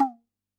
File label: TABLA, PERC